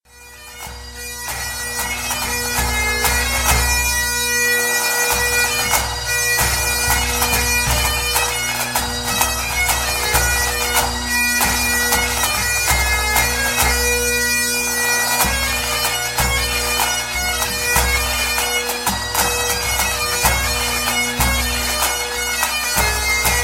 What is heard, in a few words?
Hornpipes